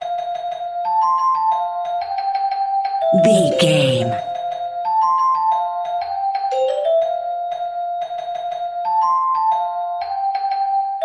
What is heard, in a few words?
celeste; Here We Go Round The Mulberry Bush French Celeste